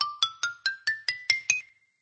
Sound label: xylo scale 8 notes